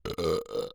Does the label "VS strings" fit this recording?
No